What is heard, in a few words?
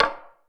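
GATE percussion; Crypton - DrumBase5000; GATE KITS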